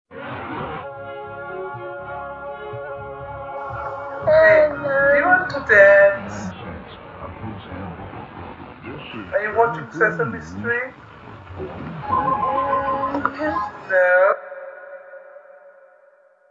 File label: Slowed Down Effect